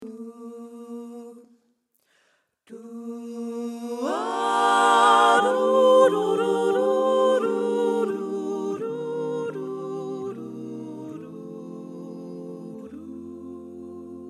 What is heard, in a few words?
kurz Sopran